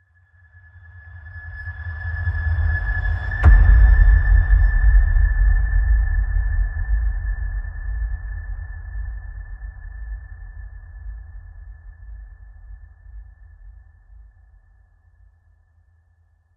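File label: Hit Hor, horror hit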